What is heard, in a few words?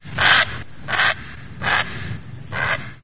heron great blue flying, birds; stamps; animals